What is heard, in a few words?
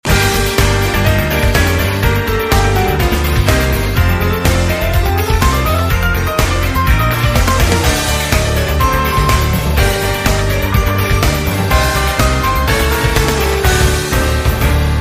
Music Only